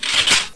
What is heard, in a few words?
ak74 cock; firearms